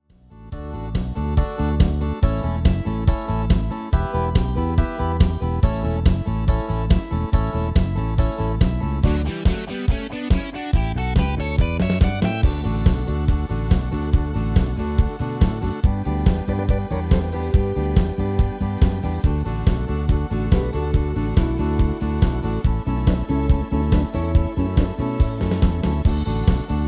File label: MIDI